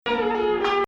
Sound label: Nahawand, maqam; Dalansheen Ijma3 Shufna